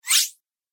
Whoosh Sharp Swipe

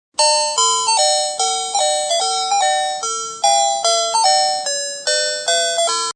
Melody from electronic apparatus; Beeps; Sound Effects:Multimedia

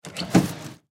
Ship Bed Wood Drawer Under Bed Open; Transportation Watercraft

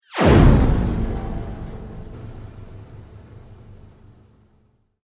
Sweep Mechanical Medium

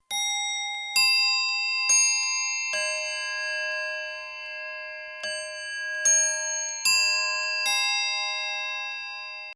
Clock Mantle, Half Hour; Clocks (Sound Effects:Machines)

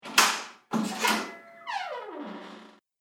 Door Open; Miscellaneous Door Sound Effects

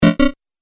Multimedia Lo-Fi Complete, beep; Business Programs, Apps And Computing Noises; Andrew Fleming

